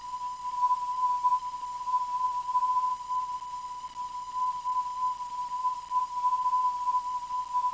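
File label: non-directional beacon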